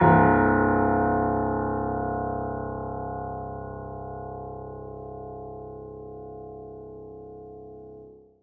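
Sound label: piano forte c1; Sonatina Symphonic Orchestra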